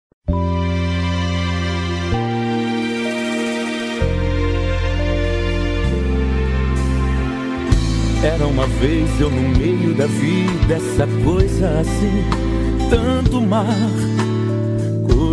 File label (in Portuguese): Romantica Feminino